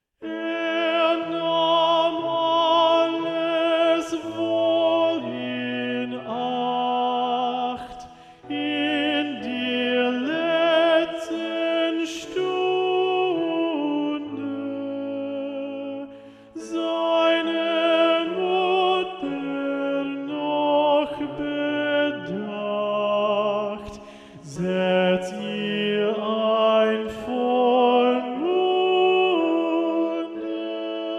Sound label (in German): Er nahm alles Tenor